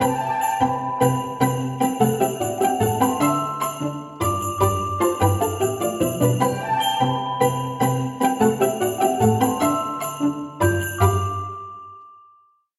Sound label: cheerful morning; Google; Google Sounds; Seasonal Celebrations; alarms